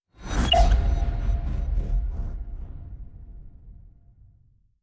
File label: notification destiny trait; notifications; Stellaris; paragon